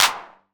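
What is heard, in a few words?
909 clap; PERC TR; Wizoo - Platinum 24 Electronic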